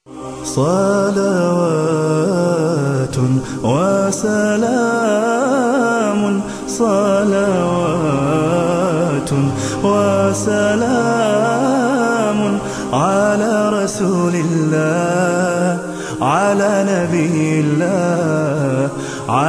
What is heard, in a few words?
islamic nasheed